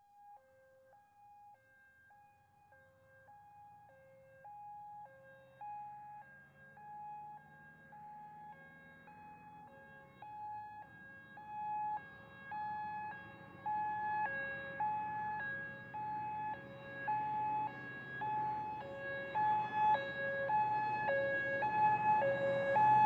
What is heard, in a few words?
Fire Engine, Pass L To R With Ele; Emergency; BBC Sound Effects Library